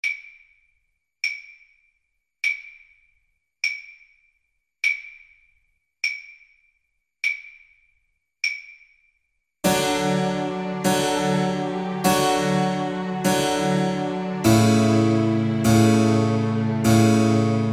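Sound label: viola karaoke; Vivaldi, Antonio; The Four Seasons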